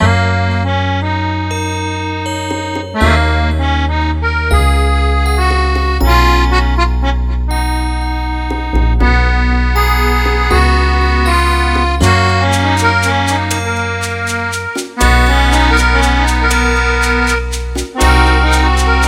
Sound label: oldie country music